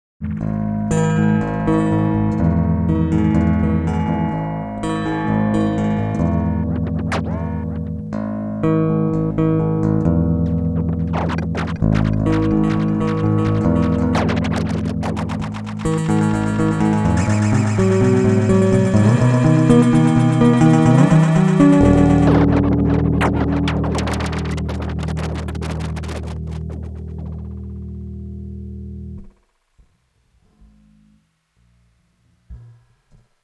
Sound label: Delay; SR KAOSS